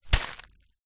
leisure juggling bean bag small catch in hand and squeeze; zapsplat